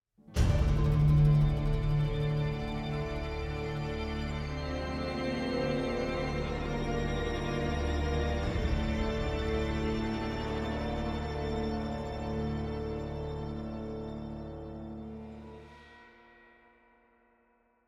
Puzzle Stinger; Syria Lock 2

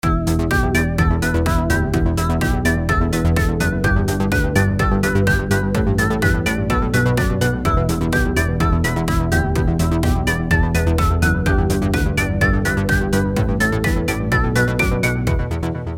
Main Menu Music; Itadaki Smash

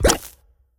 Lava Bubble; Sfx - Material Lava Bubble 01

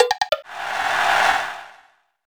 In Hole; Minigolf

